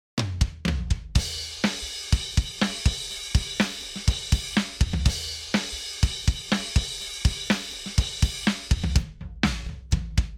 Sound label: drums dry; reason essentials